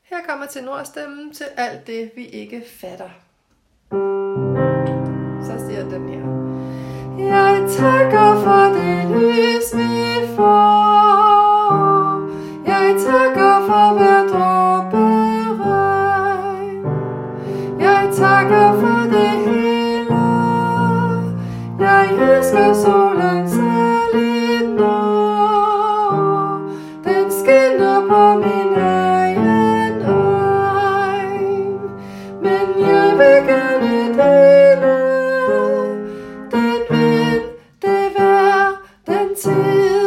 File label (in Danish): tenor